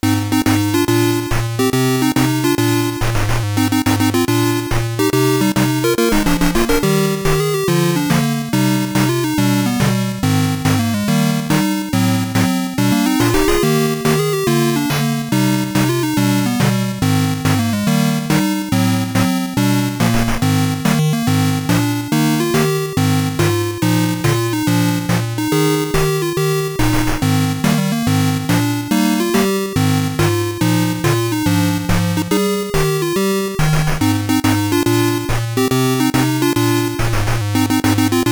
Marching Raster; terra cresta x68000

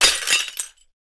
Sliced Coffee; Boomerang Fu

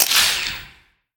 Ui Button Back; angry birds star wars 2 android ios 2013

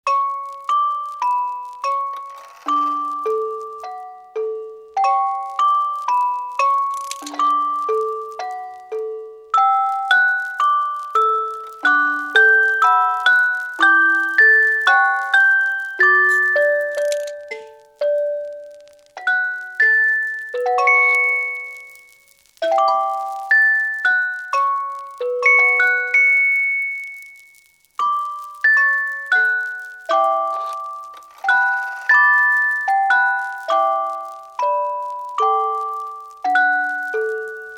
Music Box; Brain Powered

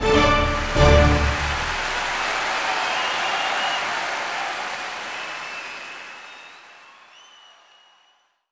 Fanfare Win

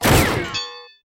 Metal Damage; Bad Piggies

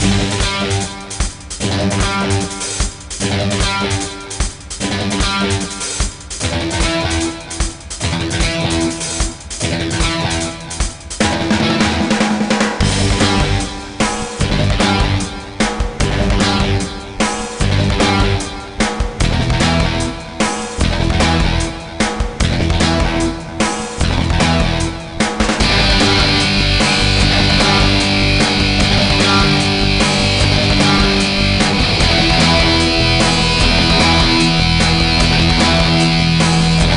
Mountain Music Loop; bigfoot collision course 2009 nds gamerip

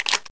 reload shotgun Shell; SAS: Zombie Assault 4

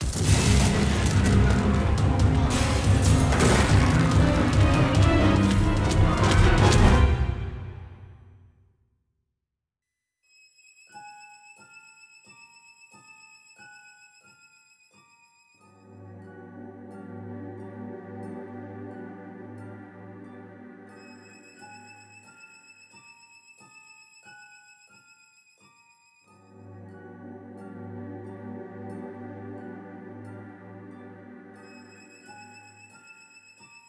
Scary; miitopia

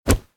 Bear Step

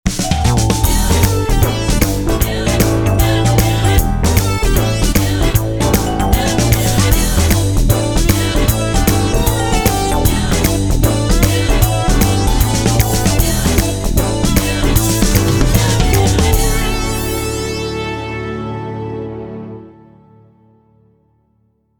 result guild lost; Fortress Paewang

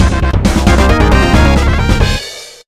BGM Sabaku Battle Enc; Irodzuki Tingle no Koi no Balloon Trip; NDS

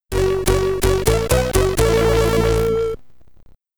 Game Over (SID Stereo); tintin on the moon; commodore 64

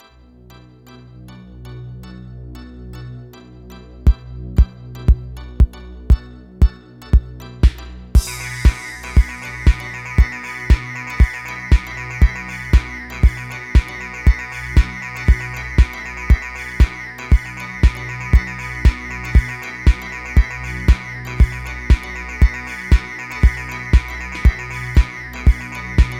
Dungeon ~ Police Station

